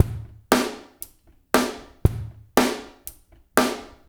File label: DRUMS1 R; Spectrasonics; Liquid Grooves 1 & 2; 117 AQUAFUNK